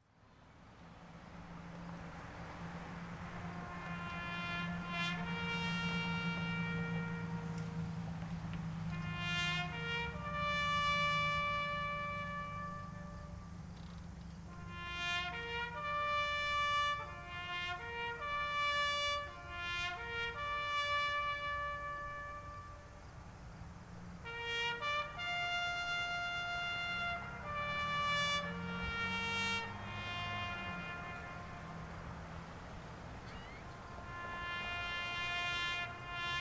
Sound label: Taps